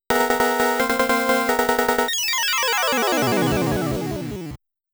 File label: round start music; Motos